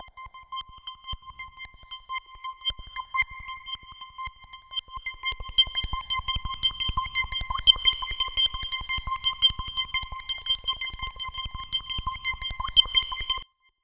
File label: C5, CLUB ORGAN; Roland JV 2080